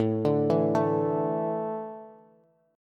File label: A Suspended 4th, Bass; Asus4 arpeggiated; JGuitar